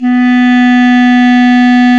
Clarinets; Roland LCDP04; 90 s Sample CDs; Clar Cls

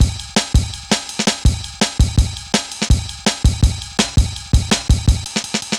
Jazz Ride, Drum Loops, 165.5; Jungle; Zero-G Jungle Warfare 1, 2 & 3; Jazz Ride B; 90 s Sample CDs

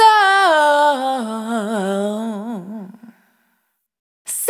Output, Single Voice Melodic Loops

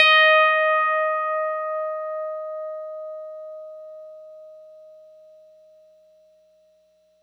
FM Tine d#5, Yamaha YX81Z

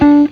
BLU SFT FNGR, Guitar; 90 s Sample CDs; Quantum Leap - Guitar & Bass

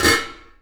LID, household; AKAI Anime Sound FX

